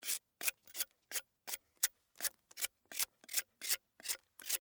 Carrot Peel Knife, Household, Carrot, Peel, With, Knife; Food Sound Effects; Blast Wave